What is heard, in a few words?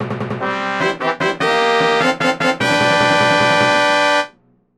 Airship Victory Fanfare